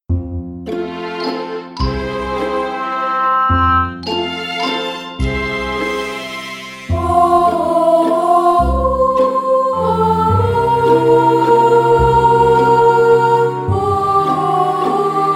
Chorus String Edition; China

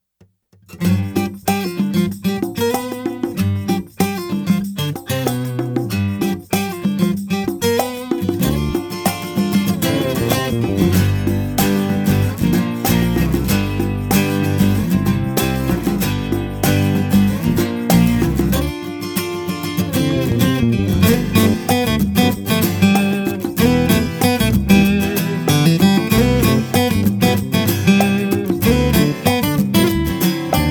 Guitar Ver.; Love Rain; Korea

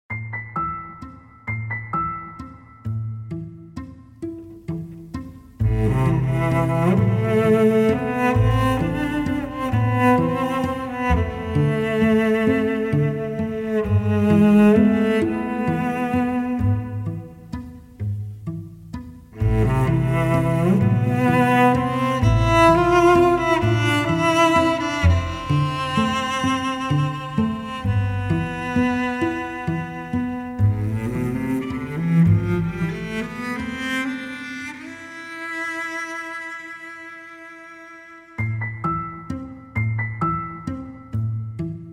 Cello; China

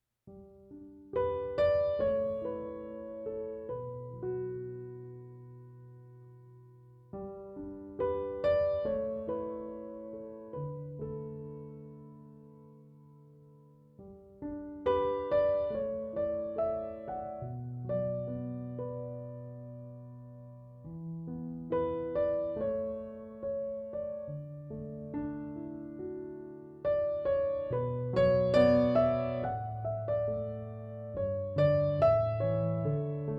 piano version; Scarlet Heart 2; China

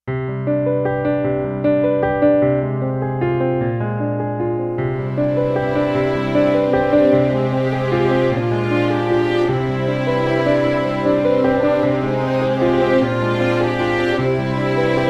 String & Piano Ver.; Love Rain; Korea

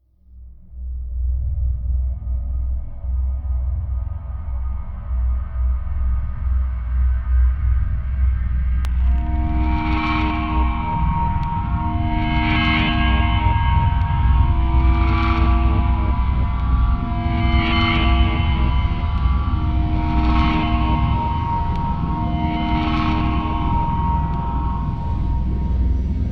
Ominous Call; Missing The Other Side; Korea

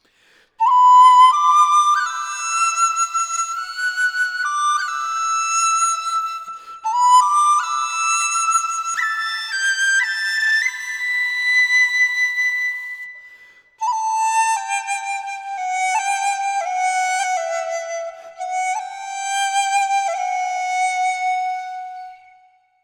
Flute (solo2); China

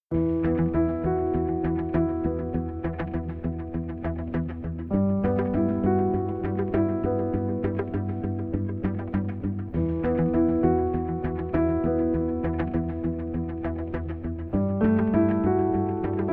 Suspense; China

